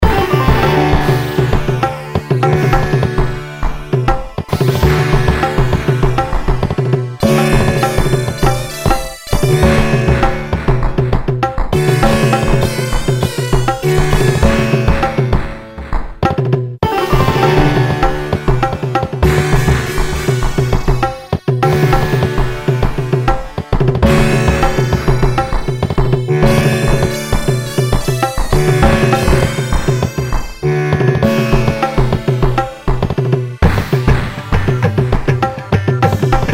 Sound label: Sitar Tablas